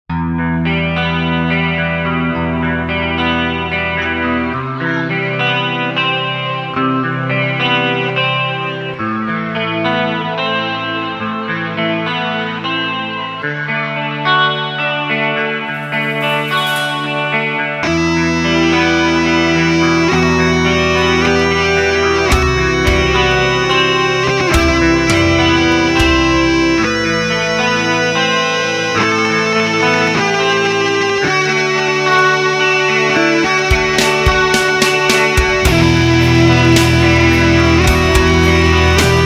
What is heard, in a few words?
guitar bird heart